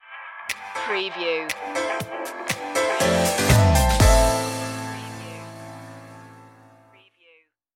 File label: disco chic stinger